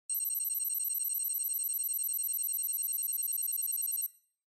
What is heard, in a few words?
Cyber